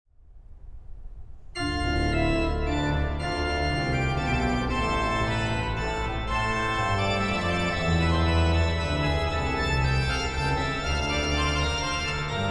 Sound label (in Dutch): orgel